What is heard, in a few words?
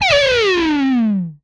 Bomb, retro arcade; Guns